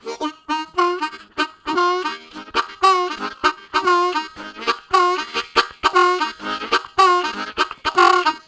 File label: Chicken, Harmonica Riffs; Zero G